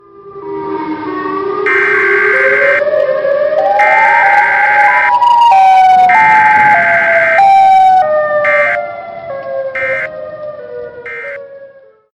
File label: siren start; ff2; siren head